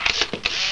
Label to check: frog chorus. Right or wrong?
Wrong